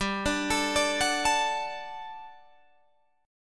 G Major 7th Suspended 2nd, Guitar, Open G; 17,19,19,19,19,19; down

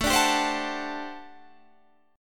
G Major 7th Suspended 2nd Suspended 4th A

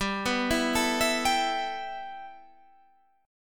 G 6th Add 9th G, chord

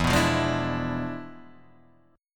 E 7th Flat 9th

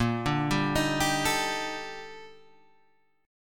Eb Major Sharp 11th Bb

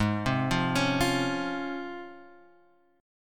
Db Major 7th Ab

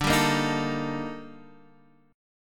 Eb Major Sharp 11th D

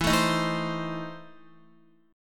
E Major 7th Suspended 4th Sharp 5th, Guitar

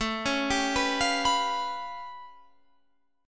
A Augmented 9th A, Guitar Standard; arpeggiated; JGuitar

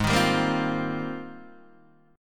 G sharp Major 13th, Guitar, DADGAD

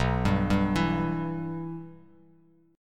B Suspended 4th Sharp 5th B Bass Standard; Bsus4#5 arpeggiated; JGuitar

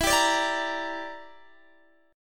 E Minor 7th Flat 5th E, Guitar Open D

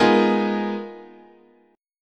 G sharp Minor 6th Add 9th, chord, guitar

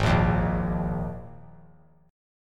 Ab Suspended 2nd; strummed; JGuitar

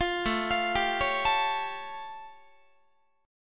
A Augmented 7th A Guitar; arpeggiated; JGuitar

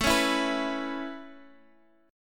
A Major Add 9th A Guitar Half Step Down, chord

